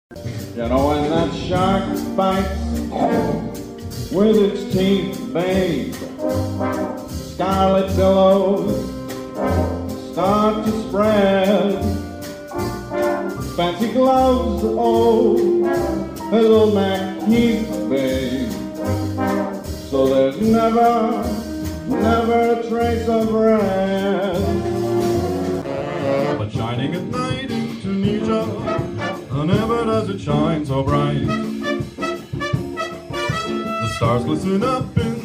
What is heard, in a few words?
live singing